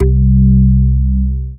Synths C; Vengeance Samples

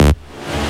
Synth Bass; Vengeance Samples; VEE Synth Shots

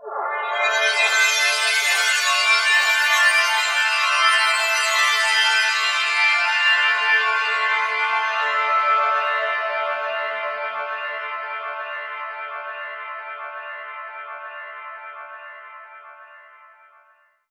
Trance Sweeps; Vengeance Samples; VEC2 FX

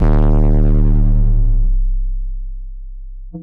808 Boom

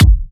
Hard Kicks; Vengeance Samples

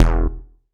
Bass Cuts; Vengeance Samples; VES1 Instruments

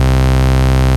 Basses Root C; Vengeance Samples

